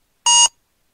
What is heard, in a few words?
Barcode scanner